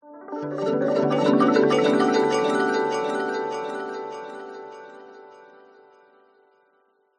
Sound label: sound design magical rising twinkling nostalgic